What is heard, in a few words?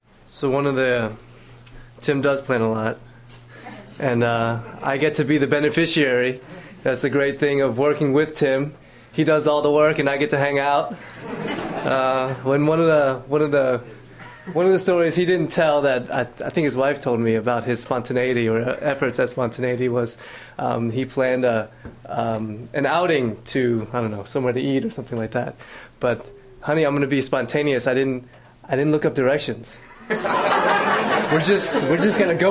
Choir song, Prayer, Comments